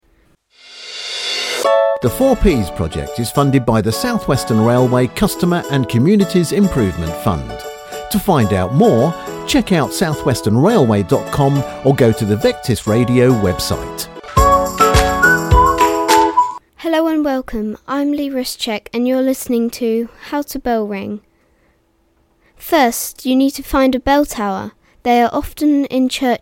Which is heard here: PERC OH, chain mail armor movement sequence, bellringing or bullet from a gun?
bellringing